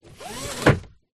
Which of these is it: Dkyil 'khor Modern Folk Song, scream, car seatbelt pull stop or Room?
car seatbelt pull stop